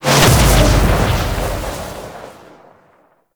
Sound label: Void Dragon Orb Cast; Warframe; Duviri; Abilities